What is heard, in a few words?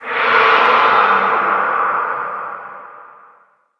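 Altar of Souls Opened; Doodad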